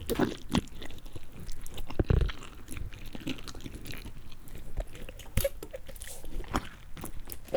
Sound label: Uncomfortable Gooshy Sounds; Micro Pack